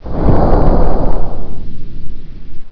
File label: door move